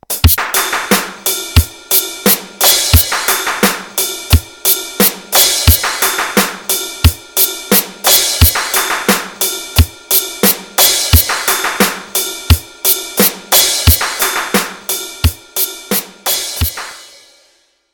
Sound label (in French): boite a rythme, walk band studio de musique